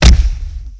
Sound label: Strike Kick